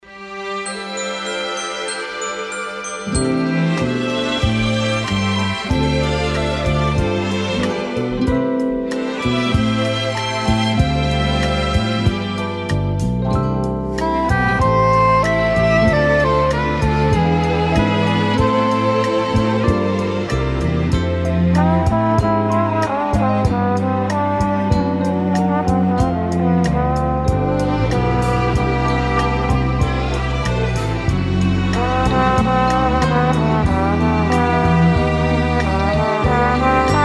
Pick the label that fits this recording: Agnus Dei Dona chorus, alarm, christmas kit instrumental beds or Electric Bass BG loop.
christmas kit instrumental beds